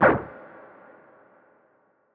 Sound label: create boulder